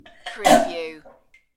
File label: Choking